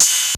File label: CYMB, COMPU DRUMS; Crypton - DrumBase5000